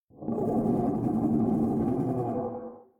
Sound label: Harpooned distant; Grounder; Evolve; Kraken